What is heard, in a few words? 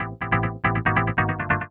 TECHNO SYNTH 140; Keys